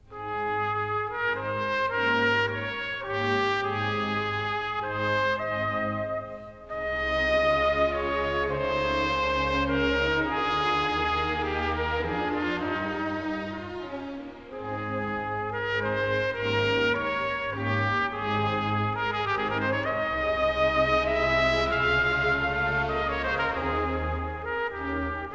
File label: trumpet concerto; Haydn